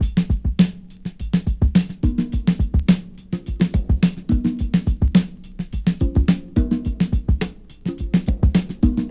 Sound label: 105 bpm drum loop sample A key